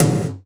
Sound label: DANCE SET, DRUMS